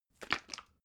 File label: bottle syrup shake single soft; berufe; gastronomie